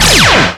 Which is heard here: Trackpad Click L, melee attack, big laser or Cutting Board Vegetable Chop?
big laser